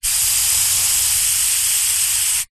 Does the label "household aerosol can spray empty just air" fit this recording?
Yes